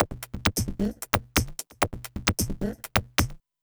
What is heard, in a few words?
House Perc, Dance Beats